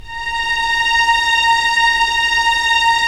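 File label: string section; Roland - LA Composer vol. 1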